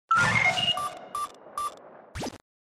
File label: New Text Alert